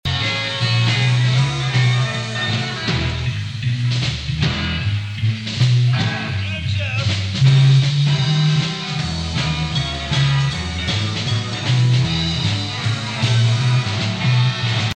blues

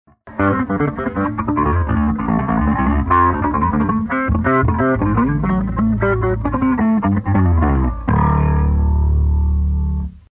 pbass ric

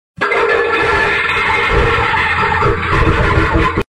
flush

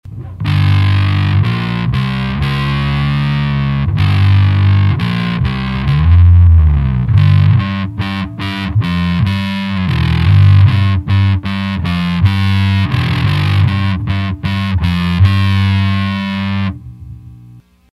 bass pod

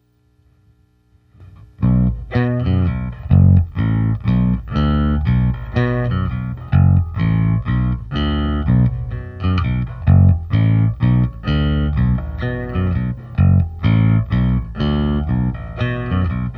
fretless w pick; Hear this bass played with a pick

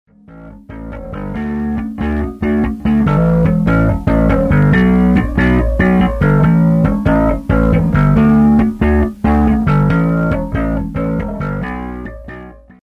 pbass ric

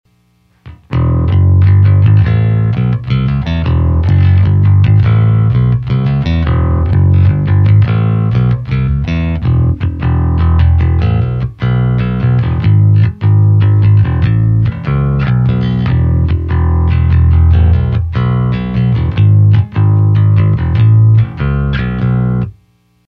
bass pod

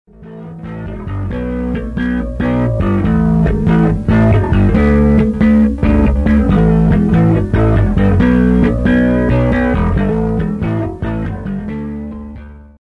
dean 10string

